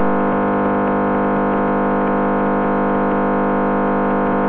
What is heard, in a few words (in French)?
TETRAPOL, signaux